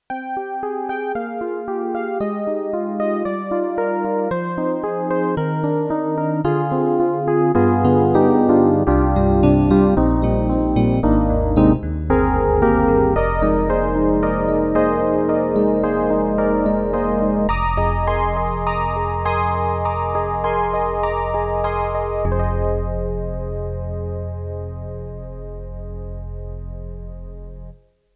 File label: Yamaha MU1000EX DX Legend